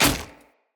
Sphere Polearm Medium Impact Wood Hollow Large

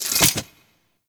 Impact Retract; War Pact; Warframe; Priest

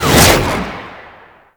Jet Turbine Pistol Secondary Shot Release

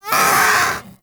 Vocal Pain; Hunting Critters; Vulpaphyla

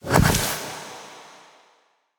Memory Go Board Opponent Place; Warframe; Sacrifice

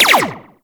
Sentinel Burst Laser Pistol Fire; Warframe

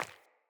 Sandals Run Default, Footsteps; Player

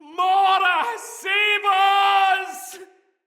Fearful Cry Afar, Soldier Male; Dendrit

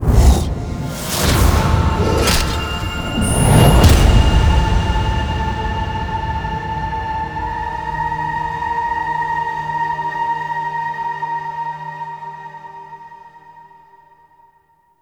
UI Board Ship; Warframe; Railjack; Player Ship

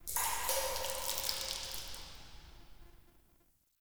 Interior Cave Drips; Ambience; Warframe; Duviri

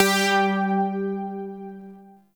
Shawzin Oct Two G; Music; Warframe; Zariman

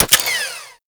Miter Mech, Weapons; Warframe; Tenno

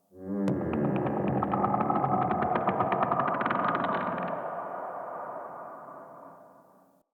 Corrupted Two Trees Talk, Ambience

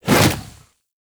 Fist Swipe; Warframe; Pacifist

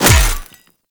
Melee Hammer Impact Robotic Flesh; Hammers

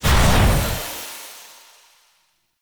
Tunnel Portal Enter; Warframe; Duviri; Ambience

